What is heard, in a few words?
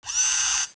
fire start, howitzer; tfa cso